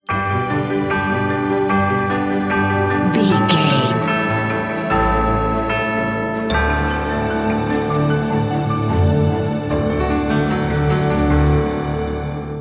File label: ahead bumper